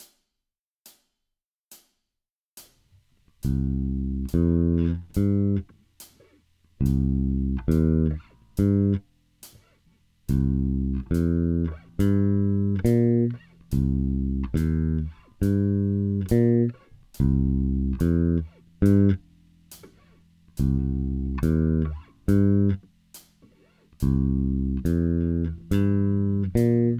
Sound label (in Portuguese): sequencias, baixo rock